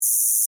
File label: Insect Voice Chirp, Vocalization, Bug; Animal